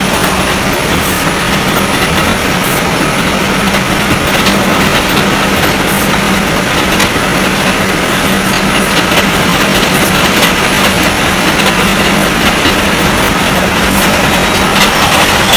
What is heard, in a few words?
run, Train; OpenBVE; Jerome Yard